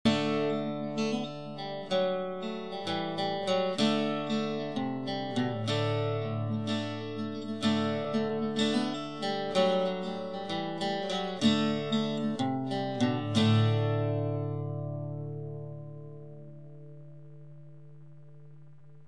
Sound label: norwood groove; dadgad series